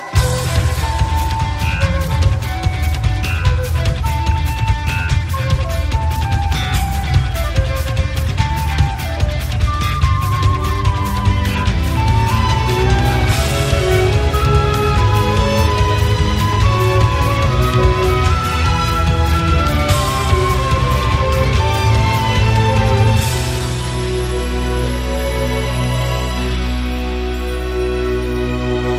quinteto

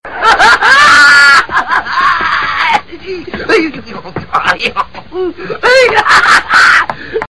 Risada

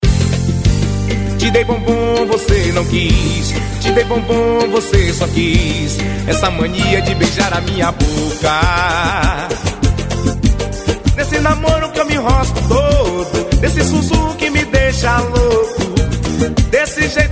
Samba Toada

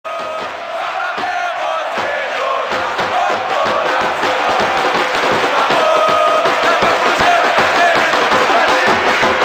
Torcida do Cruzeiro

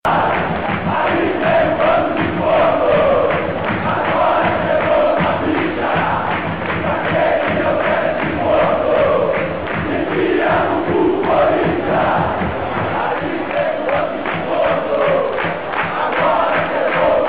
torcida flamengo

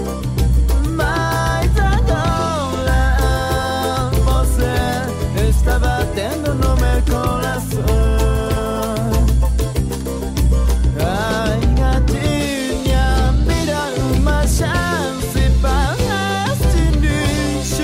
Pagode Japones

